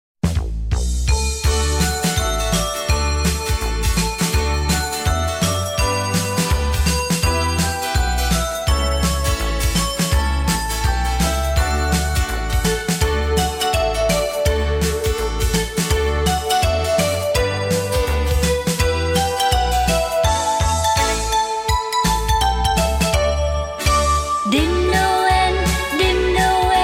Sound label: Jingle Bells